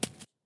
shell 39mm grass; STALKER; IWP

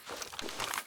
mp133 reload start, weapons

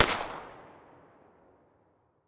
muzzle p220 mono far, weapons

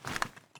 aim in, weapons; STALKER; IWP

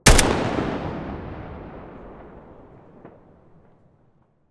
galil muzzle mono

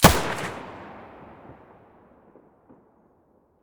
muzzle; weapons; pp1901; vityaz

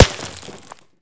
default, bullet collide; STALKER; IWP

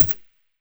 scar silencer mono far

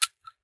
Shell 12ga grass; STALKER; IWP

